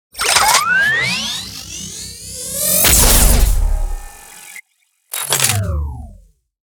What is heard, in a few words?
Plasma Gun; Warhammer 40000; Tabletop; munitorum Volume I Sound Effects